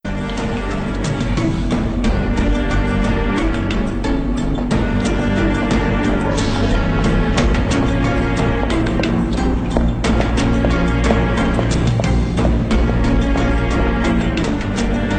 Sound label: Looking Music